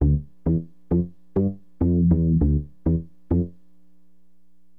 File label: SYN BAS, Acid Jazz Funk; Bass